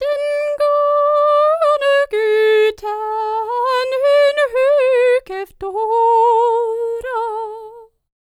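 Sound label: Scandinavian, World Voices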